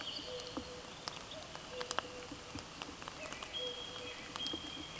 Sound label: Cuculus canorus